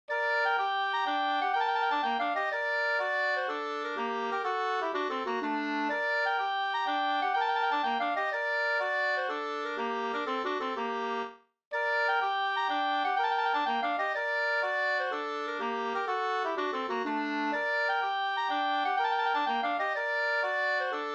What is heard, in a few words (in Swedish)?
polska brudpolska